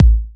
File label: Bassdrum